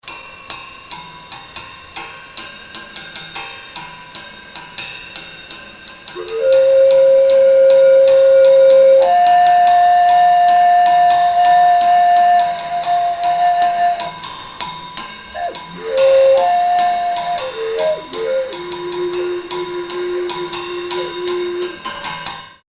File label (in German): obertonfloete downshift